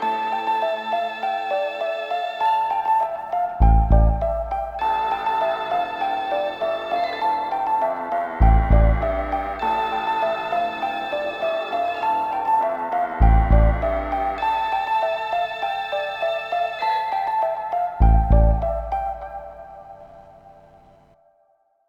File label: Acolyte Stinger